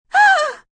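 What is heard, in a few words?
Gasp High female, Gasp, female, high-pitched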